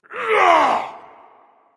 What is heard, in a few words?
grunt; smite; Vulcan